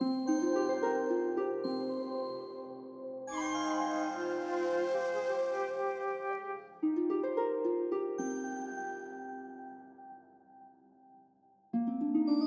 Garden MELODY; Garden v3

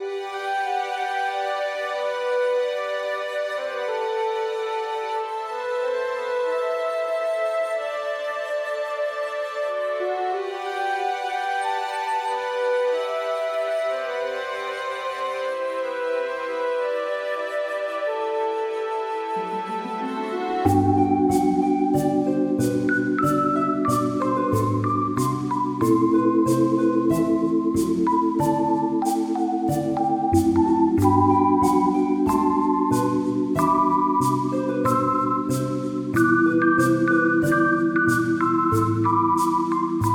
dialogue chapter break; dark deity

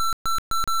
Mail; Battlezone

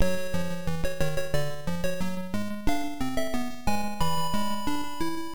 Restart; 1942

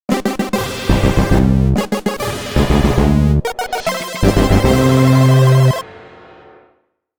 Level Up; Obsidian Prince

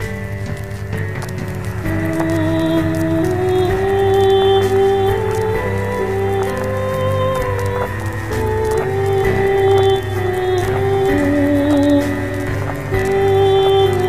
BGM; Adventure Player; psp

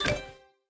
Thud Place Object; Restaurant Story 2

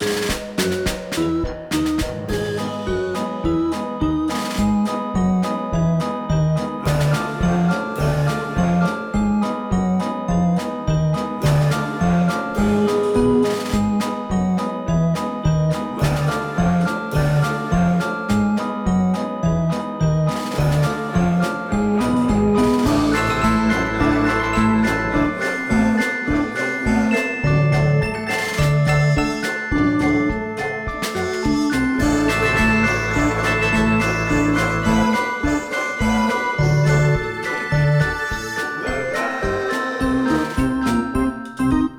Zombie Carnival; Rush2 Zombie2

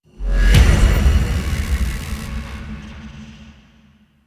Critically Wounded; Modern Combat 5: Blackout

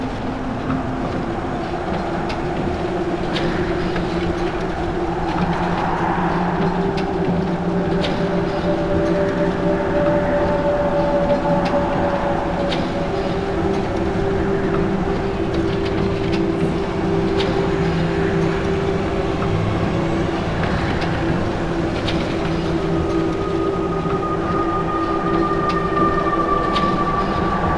Level Windmill; Dungeon Hunter; android game music

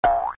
Jump

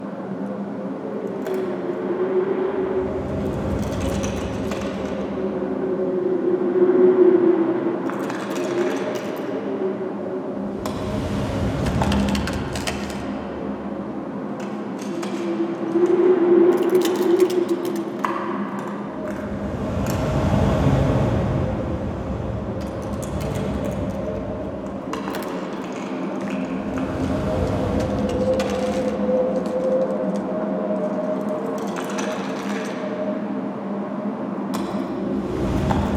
Ice Cave Ambience; luigis mansion dark moon; 3ds switch gamerip 2013

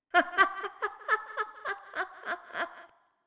Intro Laugh; Restaurant; Intro Laughter Adult

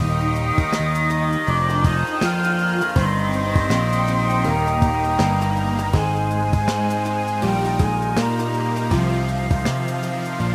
sting hippy completion; The Sims 3; windows gamerip 2009

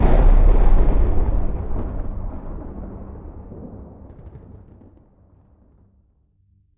Combo G; Mixolumia

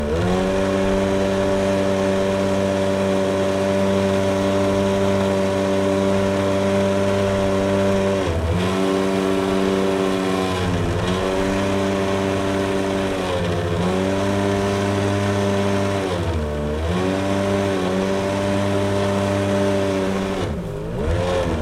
vehicle speedboat drive

